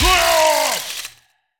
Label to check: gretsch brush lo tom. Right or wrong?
Wrong